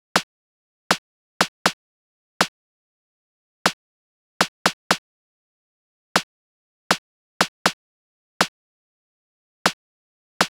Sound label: snare short; lmms; madmans